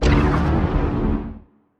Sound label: confuser laser hit, weapons; biogenesis